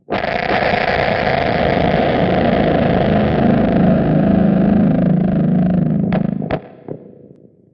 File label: horror noise